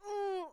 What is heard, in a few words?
pain moderate; VIVAE